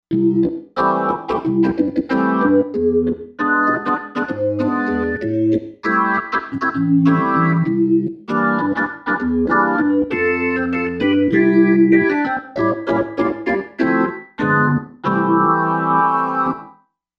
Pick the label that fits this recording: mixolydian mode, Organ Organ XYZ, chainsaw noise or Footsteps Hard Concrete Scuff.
Organ Organ XYZ